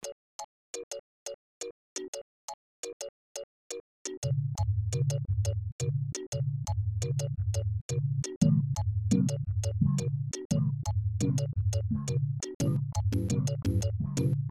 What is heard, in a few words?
afroclick